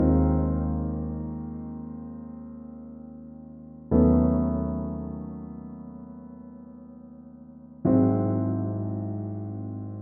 122 BPM Piano Chord Progressions Cinematic Drama Bmaj Gmin Tonic D; MMB